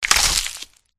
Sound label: scorpion attack contact bottom